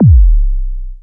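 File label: bass drum, drum machines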